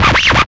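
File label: PER PROCU, Procussion, percussion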